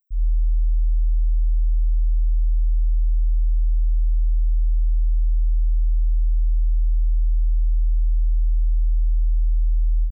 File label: 40Hz